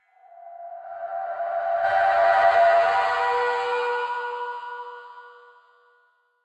echoes; thief 2014 gamerip

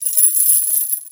CHAIN Rattle; don t be afraid the first toy

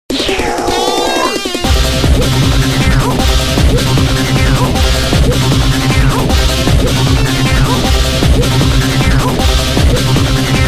Fight; Serious Sam

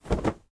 FABRIC Movement Fast mono; Don't Be Afraid The First Toy

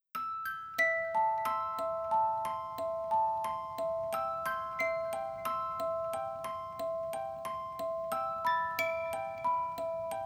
Musical Clock; Black Mirror 2 Reigning Evil

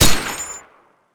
MG42

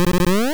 Waterfall; Pokemon; Gen 2; attack moves; GSC

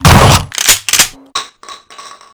MOSSBERG500

